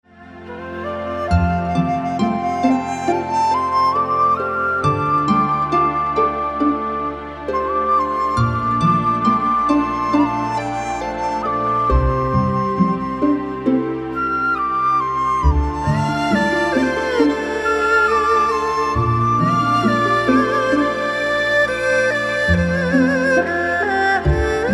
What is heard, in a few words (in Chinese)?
抒情的背景音乐